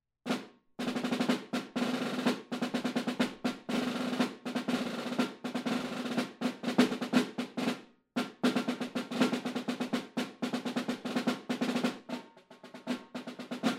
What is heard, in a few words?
Snare Drum